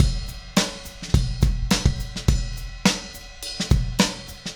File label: DH Beat, Indie Rock Anthems; Sonic Foundry (Sony Creative Software)